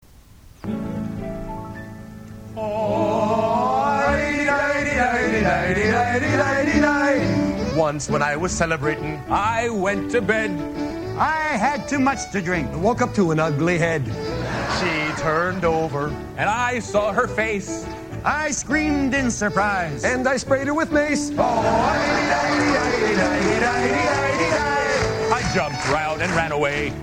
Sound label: Irish Drinking Song; Whose Line Is It Anyway?; Ugly Date; Brad Sherwood, Wayne Brady, Colin and Ryan sing about an ugly date.